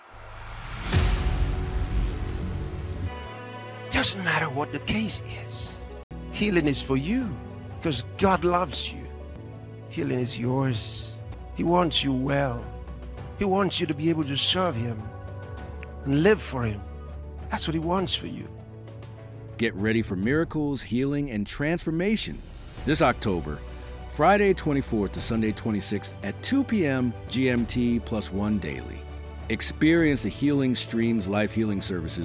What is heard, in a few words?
JINGLE URBAN GOSPEL; HEALING STREAMS; NETWORK RADIO